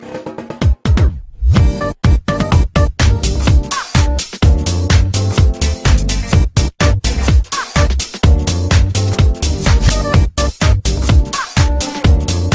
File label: Jazz